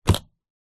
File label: office folder ring click open